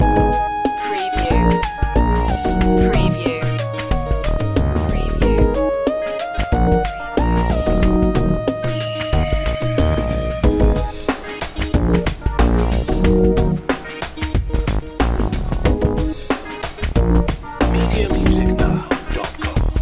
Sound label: urban love loop